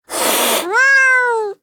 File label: Mini Tiger Figurine Attack